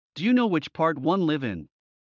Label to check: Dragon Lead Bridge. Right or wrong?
Wrong